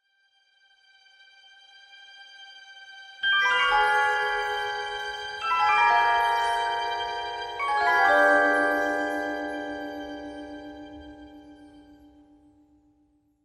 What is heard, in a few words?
jingle; Back to the Future